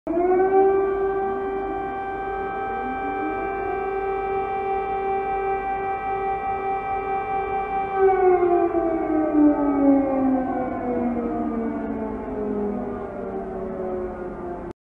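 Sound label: in Kiev a siren sounds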